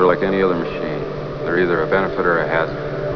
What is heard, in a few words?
machine